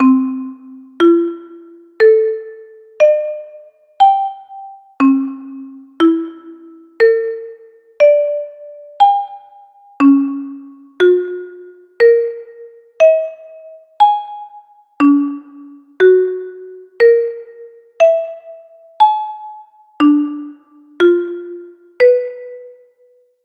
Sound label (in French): échelles harmonies